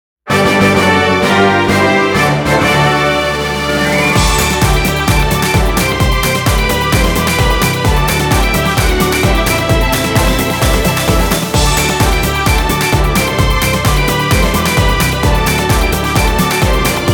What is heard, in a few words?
Victory Fanfare arrange; Square Enix Music Presents Life Style Up